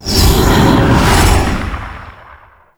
Teleport; Warframe; Stalker Assassin

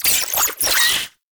Oraxia Brood Spider Vocal; Warframe

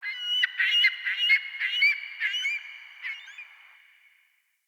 Beach Bird Distant; Midrath Beach

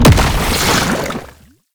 Ink Rifle Alt Projectile Impact Splash, Weapons; Warframe; Tenno

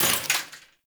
Arch Rocket Crossbow 2D Mech; Warframe; Archwing

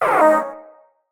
Wyrm Idle, Pets, Vocals

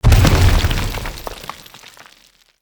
Body Slam; Bromius

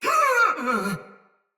Death Soldier Mace Agent Female; Battle; Sinecure Melee

